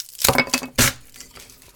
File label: crack; Apotheorasis